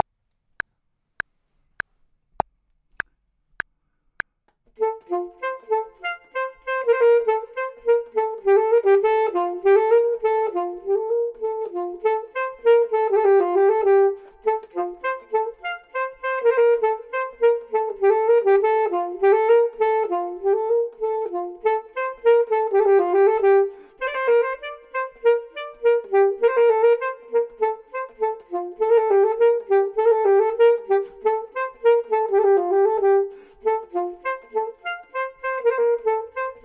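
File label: top, Saxophone and Clarinet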